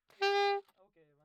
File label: Sax